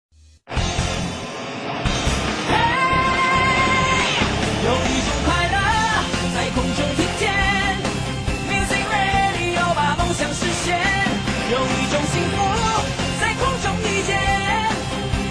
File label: Rock